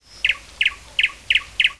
chant oiseau

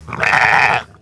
mouton

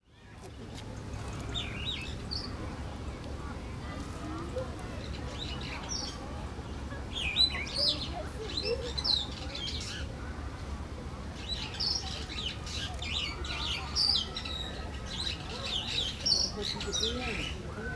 oiseaux dans un parc

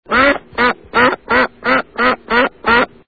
canard

